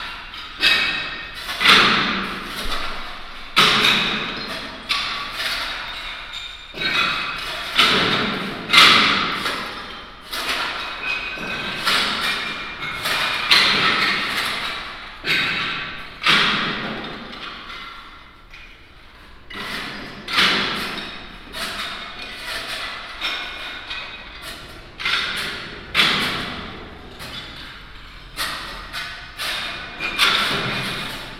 derelict shop, sergiyev posad

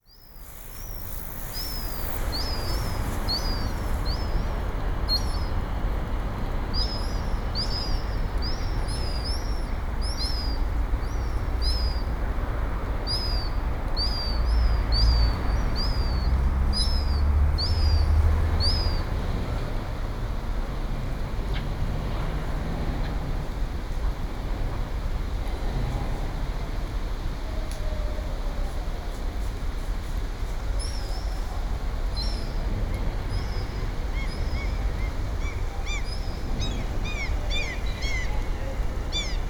concrete factory area; sergiyev posad

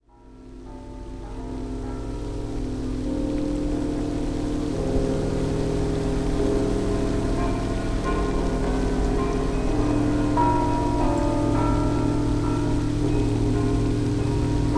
fountain chimes, sergiyev posad

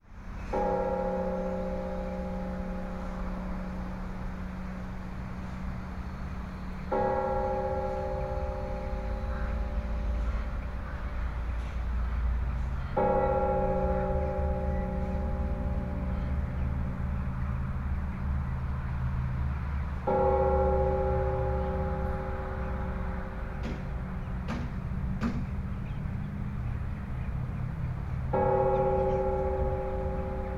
bells

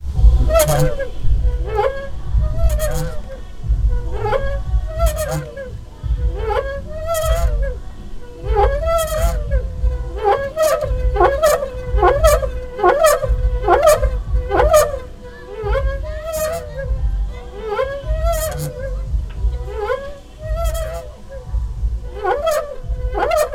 well; bogorodskoye

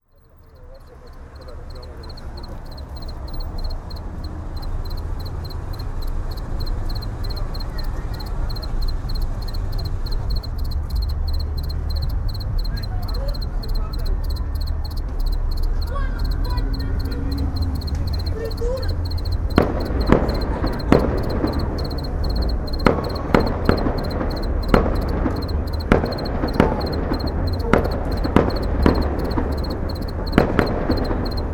cricket, Sergiyev Posad

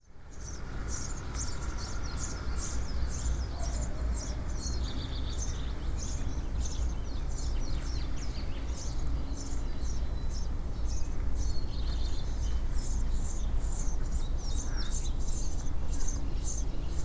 soundwalk, sergiyevposad

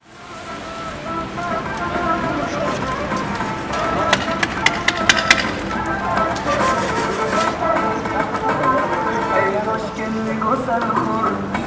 uzbek construction workers song; sergiyev posad

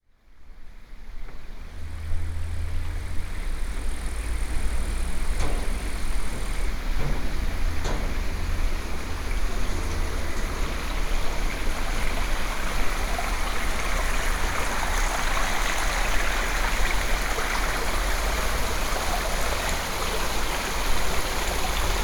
bridge walk, sergiyev posad; vifansky

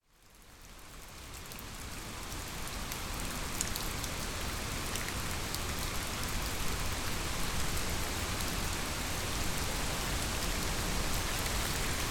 rain, sergiyev posad

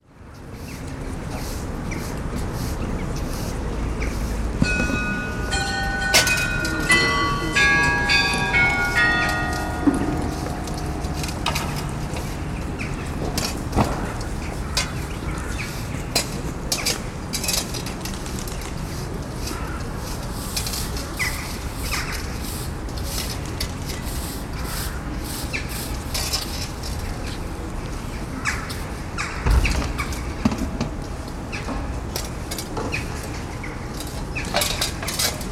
trinity sergius lavra, 70hz

